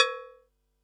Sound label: Perc, Percussion and FX; Pearl SC-40